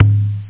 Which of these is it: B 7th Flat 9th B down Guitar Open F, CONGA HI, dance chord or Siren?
CONGA HI